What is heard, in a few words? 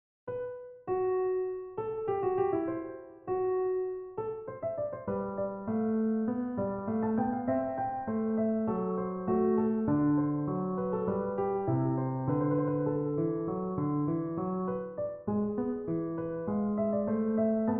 E Minor Minuet Quizzical Piano